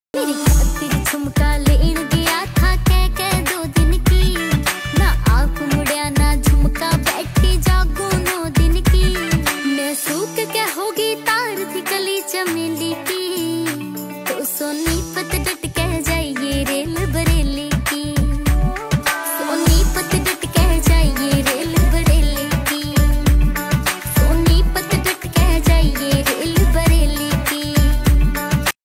haryanvi ringtone

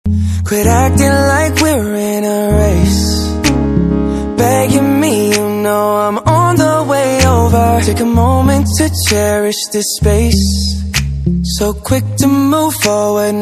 english ringtone

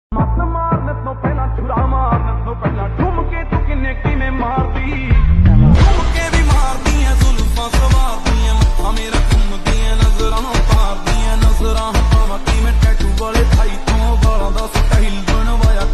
lofi punjabi ringtone

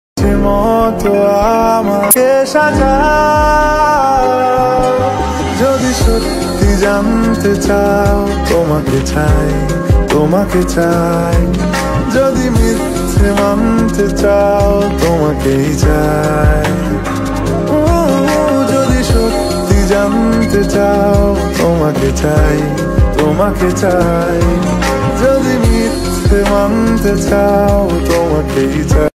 bengali ringtone

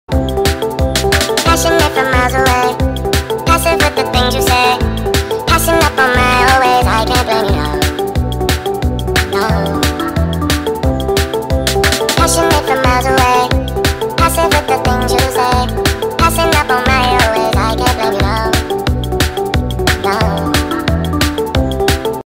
speed up english ringtone

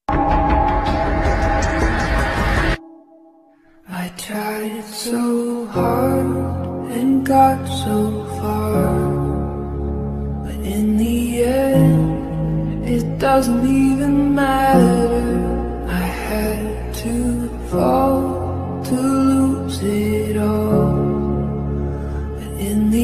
slowed reverb ringtone

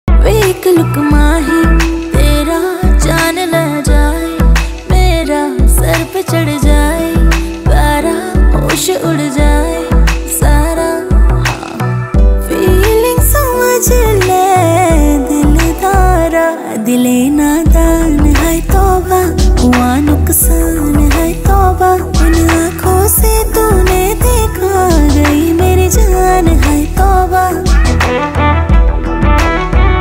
hindi rap ringtone

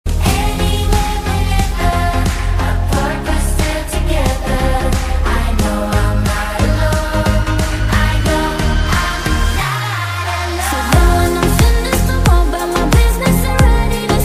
mashup ringtone

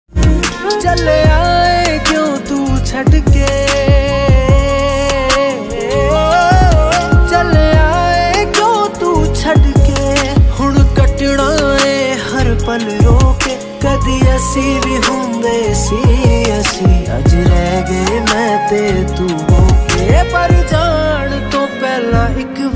Punjabi Song Ringtone

